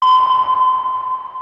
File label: Sonar Ping Loop